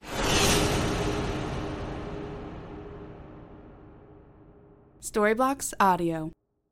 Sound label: flash back impact reverb